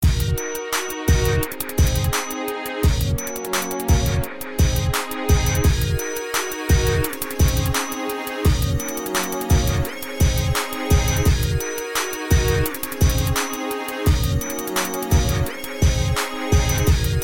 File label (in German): Hip Hop Alarme